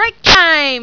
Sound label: BREAK